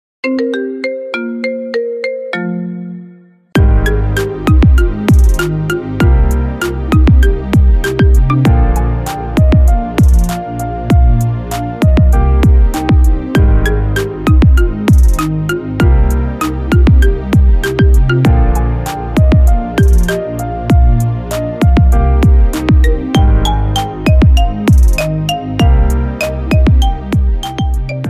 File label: Better Marimba; tono de llamada